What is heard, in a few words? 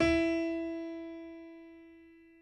piano chord